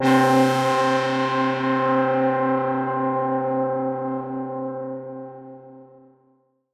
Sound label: SYNTH Fried, C; Melodic One Shots